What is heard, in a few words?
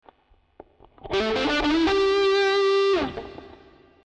RAT